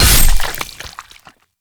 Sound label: katana slice, samurai